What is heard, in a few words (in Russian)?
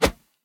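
молниеносный замах холодным оружием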